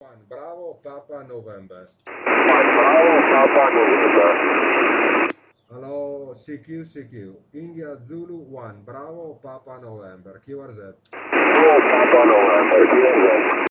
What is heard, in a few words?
SSB echoes; 1296 EME